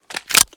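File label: pistol inspect xmag stock mag in; reloads; gmod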